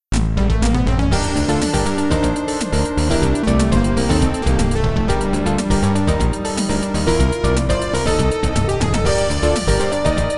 KICK (MT-32); Crystal Rinal; PC-9801

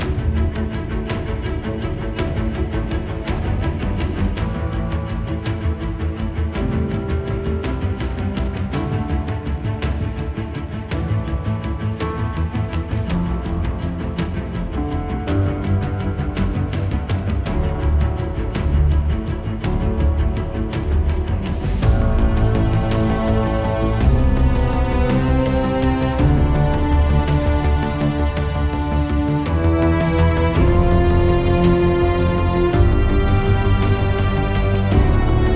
Exploring Rural Day Loop

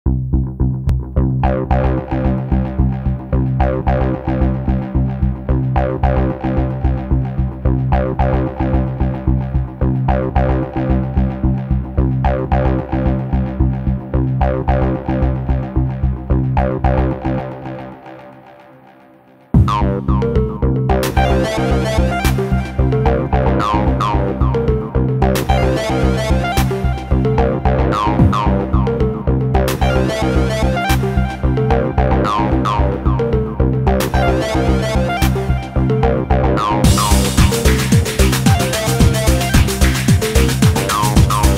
Beat Ambience; Streets of Rage Remake

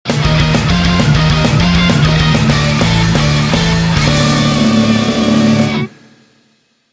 All Clear; contra remixed wars music collection 2022

